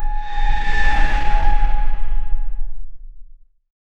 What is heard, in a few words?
epic